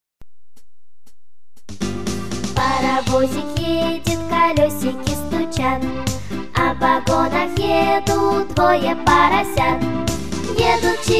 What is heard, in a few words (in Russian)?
parovozik muzikalniy, the train song; razvivayu iy multfilm dlya malisey